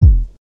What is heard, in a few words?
drum